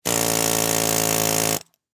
Air Hammer Operate, Industry, Air, Hammer, Operate, Long; Air Pumps; Machines; BlastWave